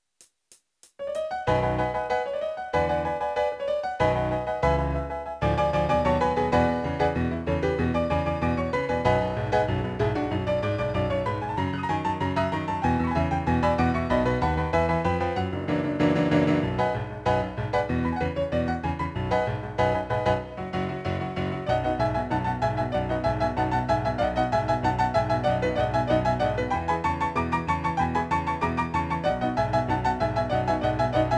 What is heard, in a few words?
Piano Rock